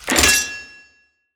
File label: unstable crystal ready